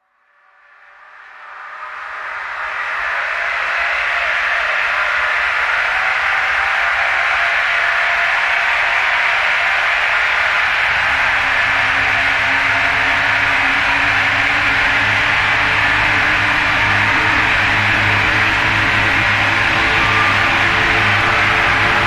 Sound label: Rise; Ninja Tracks